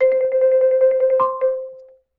Xylophone Roll

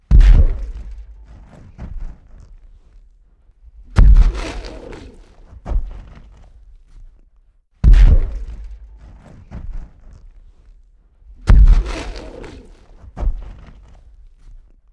Monster Footsteps

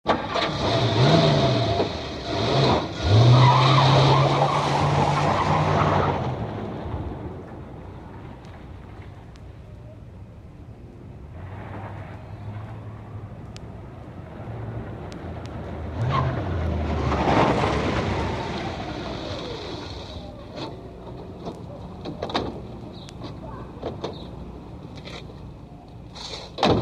Car Leaves Arrives

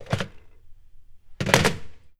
Phone Pick Up Hang Up

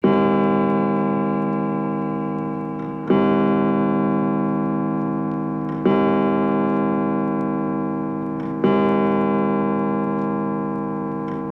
Grandfather Clock

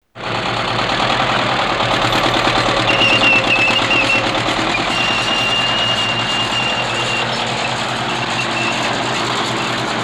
Sherman Tank

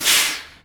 18 Wheeler Air Brakes; Vehicles

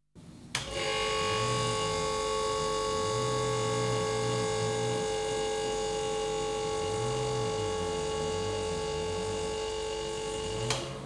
Old Refrigator

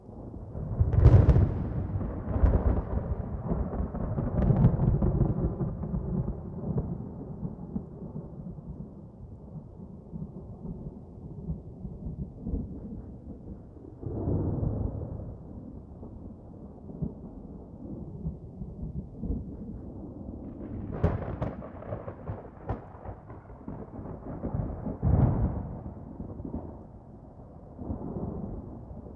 Thunder Light Rain Short

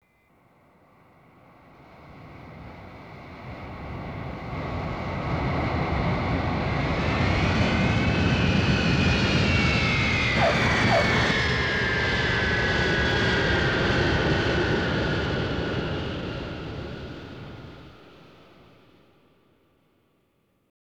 Jet Landing

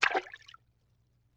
Water Splash Small; Vintage Cartoon; Foley

